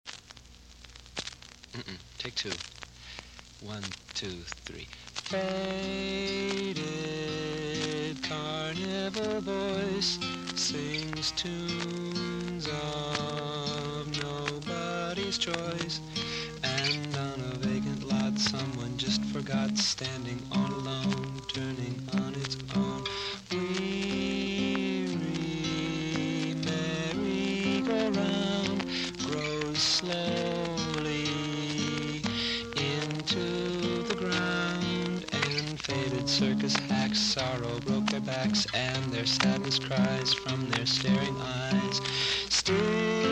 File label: Merry Go Round, acoustic guitar; demo